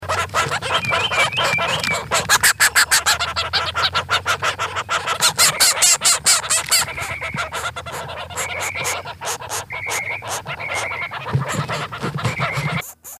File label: flicker babies get fed